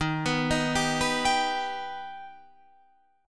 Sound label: D sharp Augmented D sharp, chord